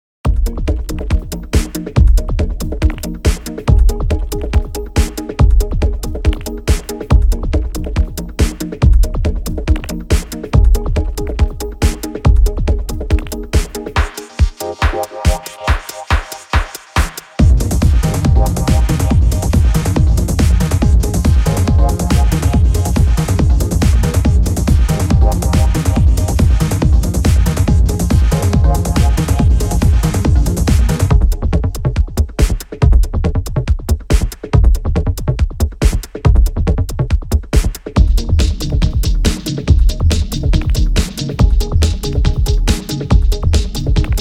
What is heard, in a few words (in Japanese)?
テクノ